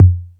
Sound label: Beat Box; E-MU Pro-Cussion